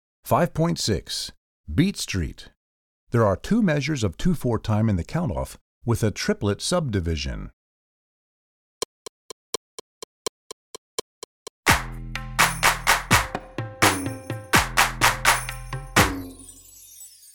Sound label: Beat Street, Percussion